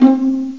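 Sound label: pizzicato strings; gmplay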